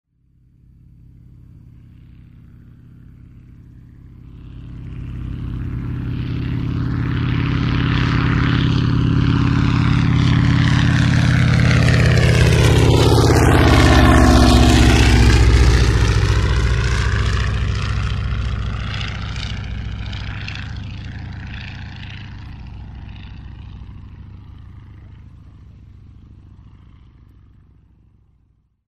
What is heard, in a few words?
ms317 passage